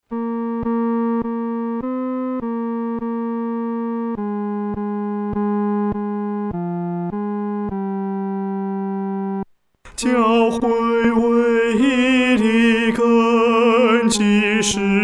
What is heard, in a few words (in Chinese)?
独唱（第三声）; 教会维一的根基